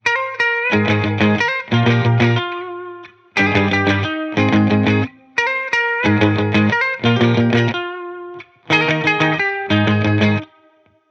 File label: guitar loop 90 Ab; Pop; Guitar Stacks; SD PASADENA Pop Sample Pack; Slate